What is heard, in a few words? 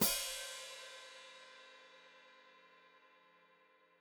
Hat Closed Open, Hi-Hat